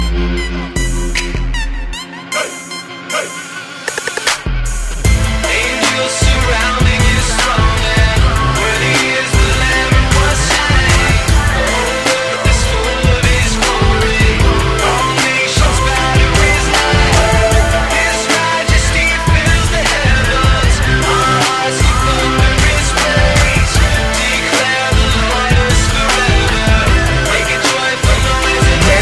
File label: Joyful Noise